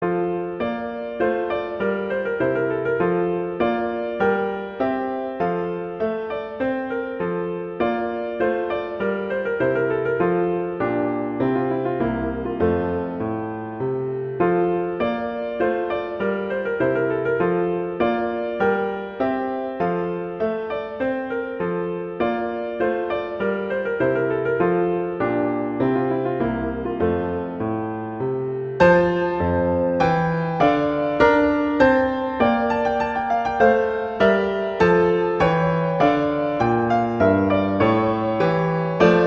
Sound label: Minuet; 12 Pieces